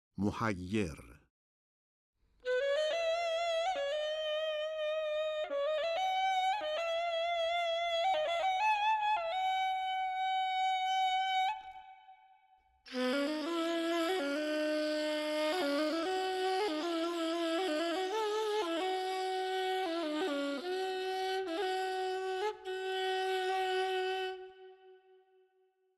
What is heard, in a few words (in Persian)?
Mohayer Nava Radif-e Ney, Dastgah Nava